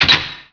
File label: unlocked metal door